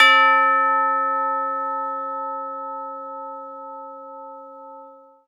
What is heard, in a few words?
Bell Hit; Cinematic Funk; Sonic Foundry (Sony Creative Software); Cinematic Funk Urban Tone Poems